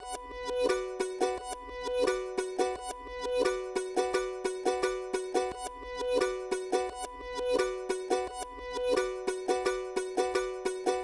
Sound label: Charango, Hip Hop Exotica